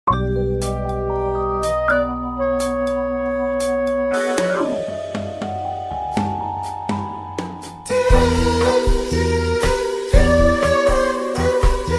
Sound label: ui pause lp; pause music; PvZ Garden Warfare pause music